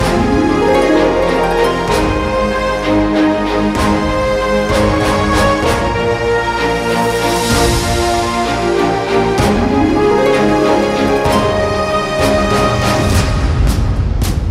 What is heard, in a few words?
Instrumental Ringtone; Chhapaak Movie